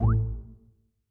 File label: Balloon; Longhorn 7ista Sound Scheme by David Mounder